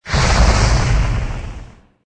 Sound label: fire; croods